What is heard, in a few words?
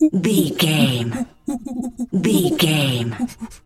monkey chatter